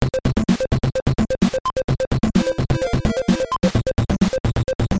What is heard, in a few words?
powered up; bomberman